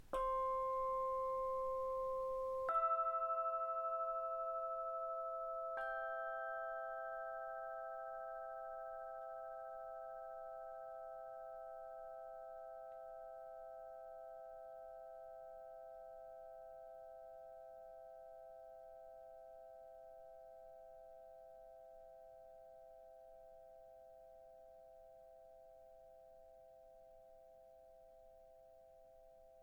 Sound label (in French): Cloche plate C5 E5 G5